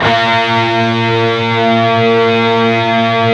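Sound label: PRS power chord B 3 R, guitars; PWR CHRD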